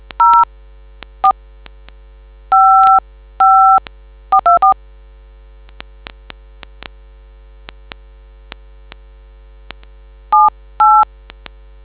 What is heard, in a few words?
dtmf